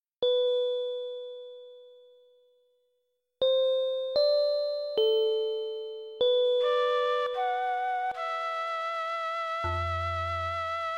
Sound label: Ambiance MIDI; Witchaven 2 Blood Vengeance